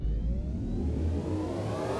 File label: win Attention; netent; multipliermayhem